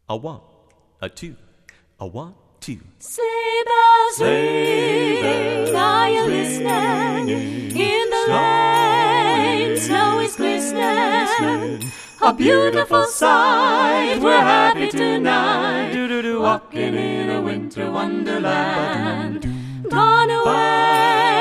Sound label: Carolers; Minneapolis